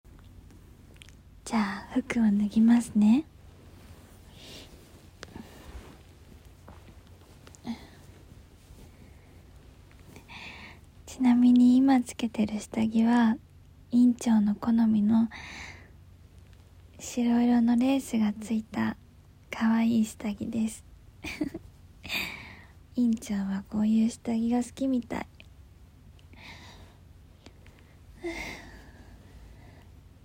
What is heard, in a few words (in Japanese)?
実演